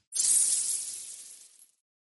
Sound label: collect gold